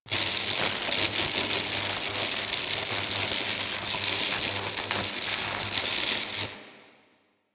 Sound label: Scrap Container Loop Layer B; Warframe; Missions; Zariman; Zariman Assassinate